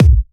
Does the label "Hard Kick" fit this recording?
Yes